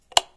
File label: hover out